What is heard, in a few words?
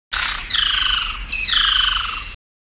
ambi, jungle; gearbox